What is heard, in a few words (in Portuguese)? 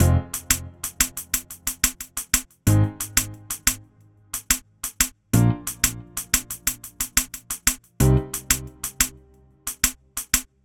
Beat Pandeiro; DF KIT